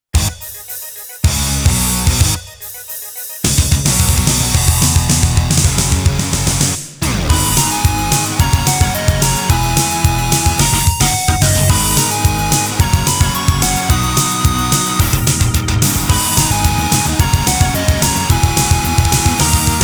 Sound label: Trap; The Legend of Xanadu; Kleene